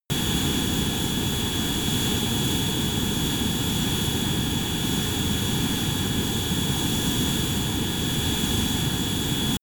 military jet a-10 thunderbolt turbine full power direct